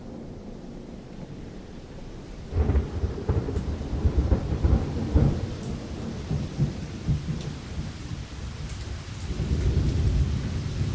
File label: thunderstorm sounds